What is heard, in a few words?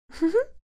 Female giggling